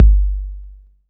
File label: BD, Dance Mega Drums, HIP